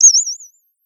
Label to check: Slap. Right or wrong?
Wrong